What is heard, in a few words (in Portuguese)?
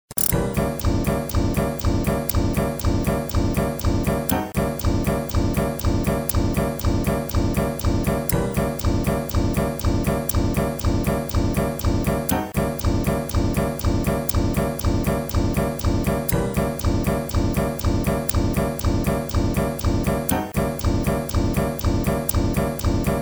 dу em dobro piano